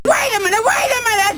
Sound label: load; Digital Sound Studio (DSS)